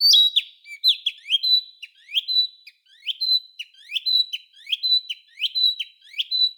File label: twittering narcissus flycatcher